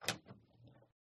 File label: Door Closet Open; uberduo